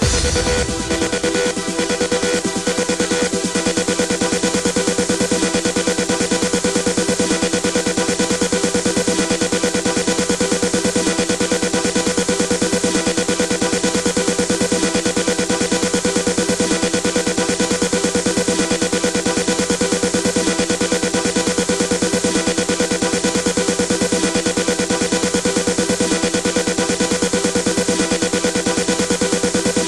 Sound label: RINGTONE